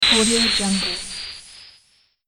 servo robot gas

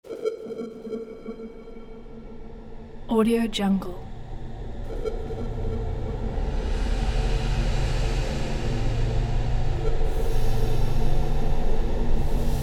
dark ambient atmosphere mysterious

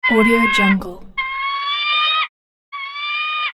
falcon calling

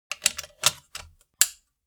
object household socket pull plug

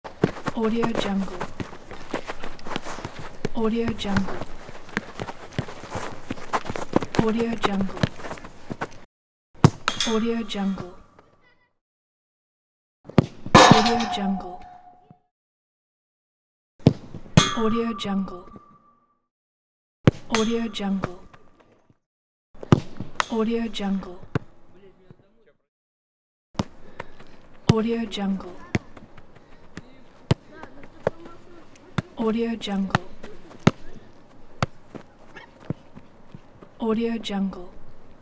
play football

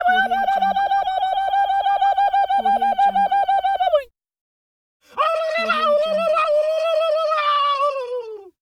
funny indian yelling

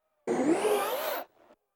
servo short bursts whir various speeds

